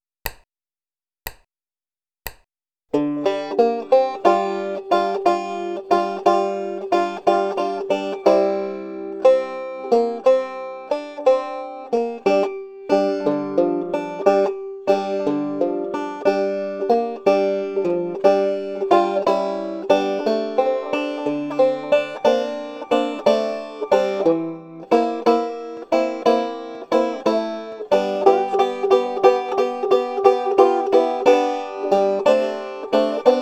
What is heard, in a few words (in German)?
8 taktiges Blues Schema